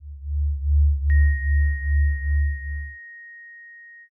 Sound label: eerie ambient